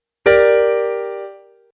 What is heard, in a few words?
G Minor Triad